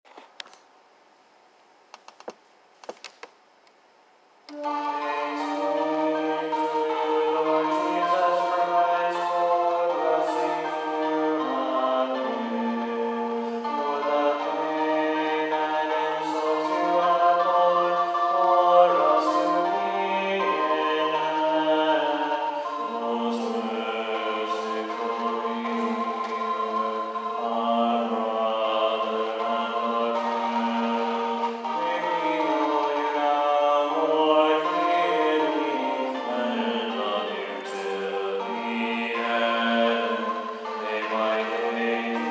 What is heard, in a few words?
alto with midi